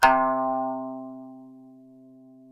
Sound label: KPES C3X, KANUN; SoundBytes; Early Patches; KANUN SMAL